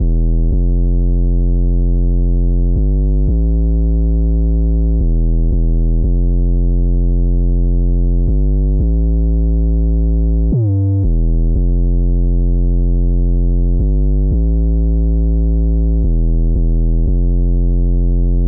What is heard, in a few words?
174 BPM A Min Sub, DnB; Melodies; Loop Stems; Axis